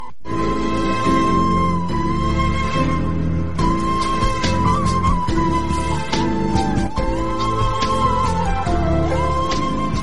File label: flute ringtone